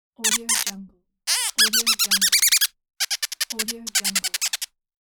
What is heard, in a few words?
cartoon mouse squeak